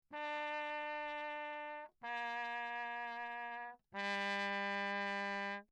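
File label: trumpet mute low; Perinétventiltrompete; Lechner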